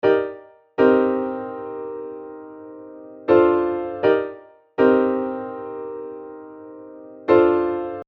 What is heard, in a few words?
key